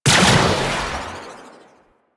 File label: Shot, Weapons; Prisma Angstrum